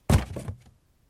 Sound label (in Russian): zvuki, gde chelovek spotykaetsia